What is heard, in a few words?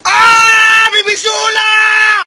ahhh